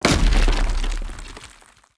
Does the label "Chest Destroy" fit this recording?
Yes